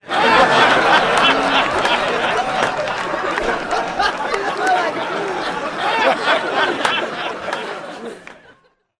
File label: Crowd Laughter